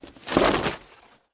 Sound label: shuffle